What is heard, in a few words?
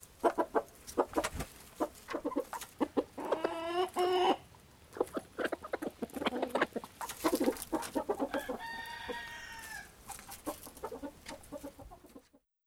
Chickens